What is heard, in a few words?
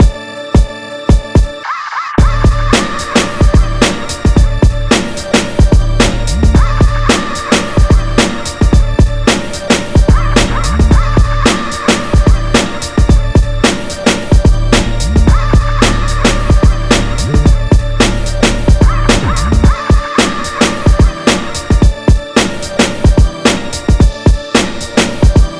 Breakbeat; Hitnapperz